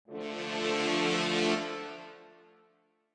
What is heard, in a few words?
French horns Dm 160, French horn fade in loop Dm 160 bpm.; Woodwind Loops